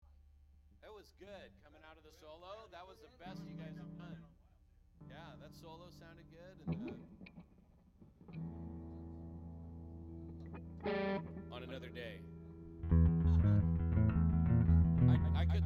Strings Mix

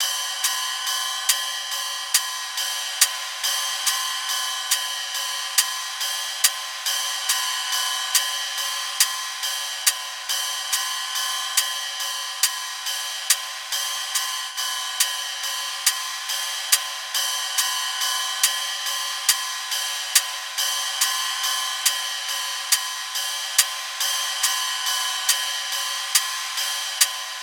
hats 70, Hat Loops; drum sample pack; Loihi; SEISMIC; Slate

